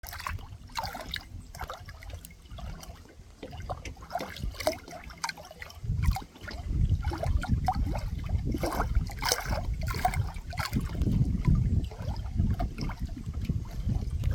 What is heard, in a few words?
Grass Snake in Water